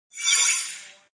SFX Generator On

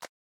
Catch; boomerang fu

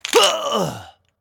Character Shaman Hit; Angry Birds Epic

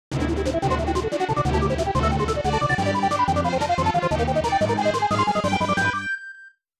Missiles Destroyed; Star Fox 2

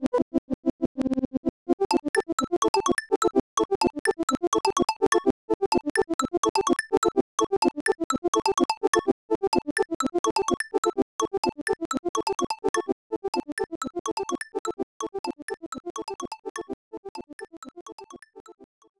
Goal

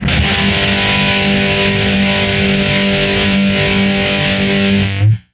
Guitar Power

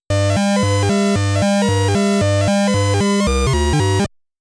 Game Start Music

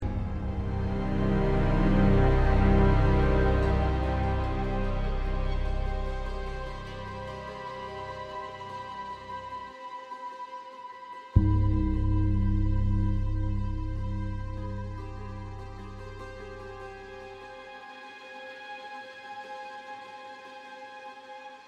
Happy Ambient; Global Conversation

